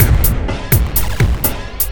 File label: Processed Beat, Drums & Percussion